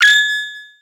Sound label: Single UI Beep